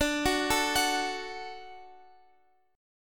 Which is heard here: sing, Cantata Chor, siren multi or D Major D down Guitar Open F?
D Major D down Guitar Open F